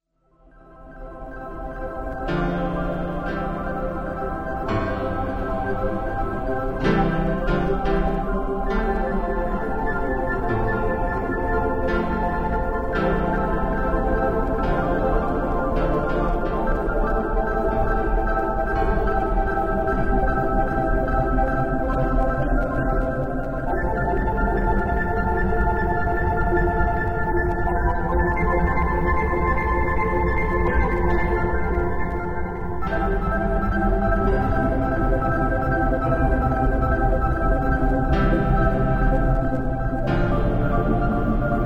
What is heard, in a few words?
piano bubbles